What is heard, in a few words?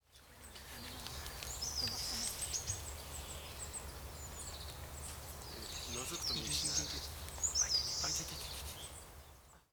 sendaimusikui, bird song